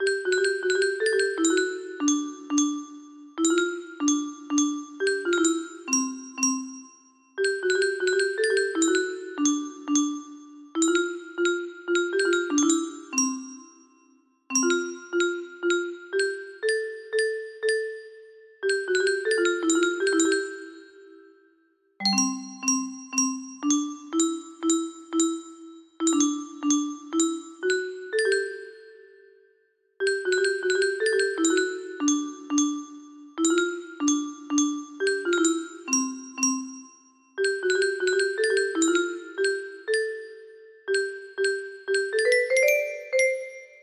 piccolo, music box

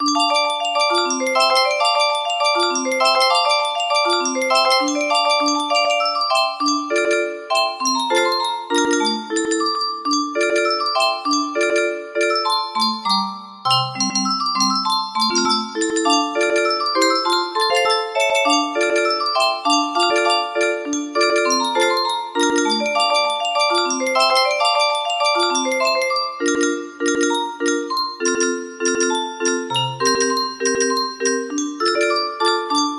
piano

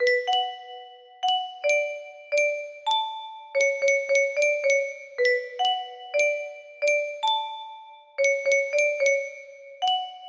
glitch, music box

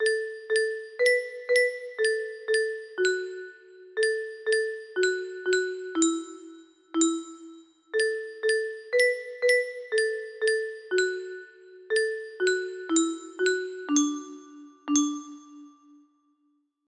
music box

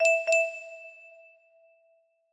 music box

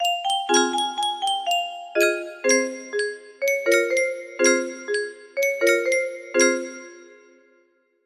music box

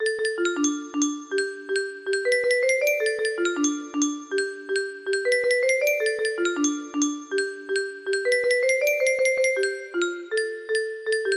riff echo, music box

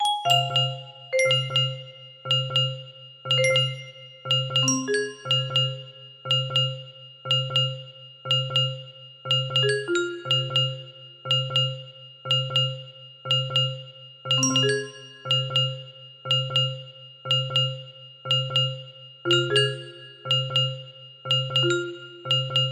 music box classic loop

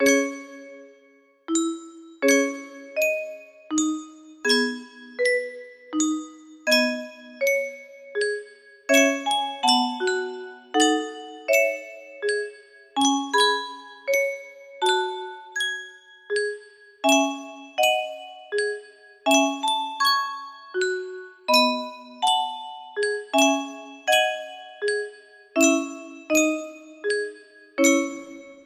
music box

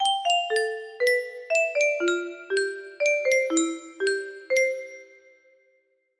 nokia tune, music box

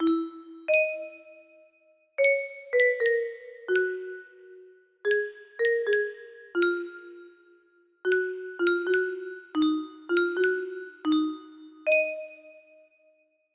a melody, music box melody

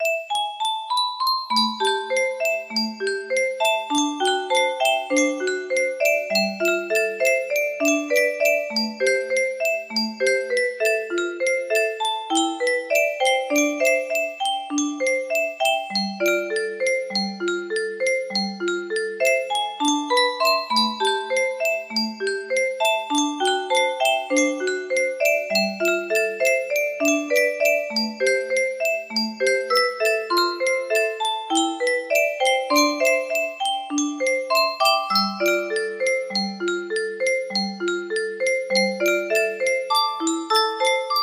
music box

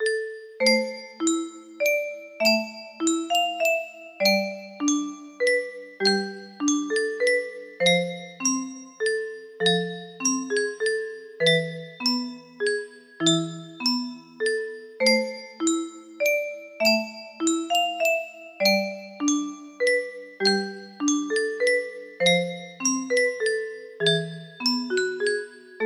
slow, music box; traditional greensleeves

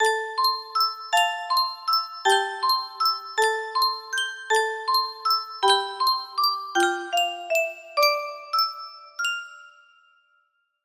piano intro

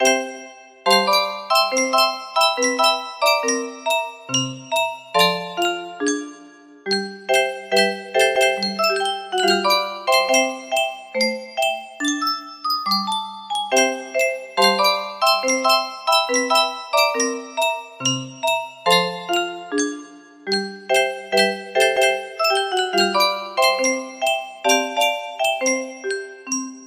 melody and piano, music box

